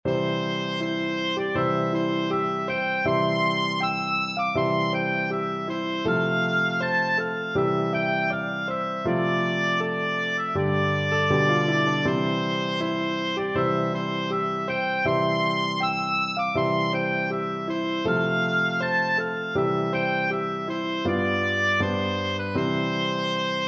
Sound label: scotland the brave, oboe